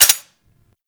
Uzi Mech; Tenno

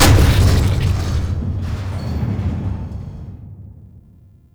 Blast Door Close; Warframe; Nineteen Ninety Nine

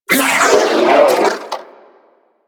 Sentient Vocal Death; Warframe; Sentients; Battalyst

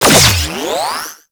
Sentient Vessel Parazon Impact; Warframe; Tau

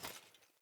Grunt Foley Movement Armour; Enemy; Sinecure; Melee Grunt

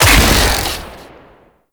Modular Secondary Barrel Fire, Weapons; SU Modular Guns; Barrels

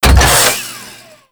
Saw Blade Launcher Mech; Warframe

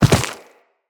Operator Land Soft Dirt, Footsteps; Characters

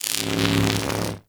Arc Rifle Fire Random; Infested

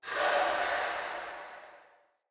Cavernous Water Lap Rock Distant; Ambience; Under City

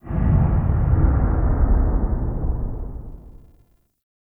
Big Hit; Ambience; Warframe; Nokko Colony

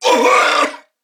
Pain Corpus Venus Male, en; Dialog; Barks; Battle; Warframe; CorpusVenusMaleB

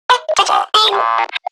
Garden Drone Vocal

